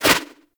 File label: Turbulence Deflect; Tengu; Warframe